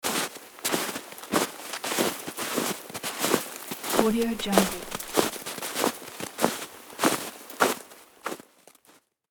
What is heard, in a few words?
walking in snow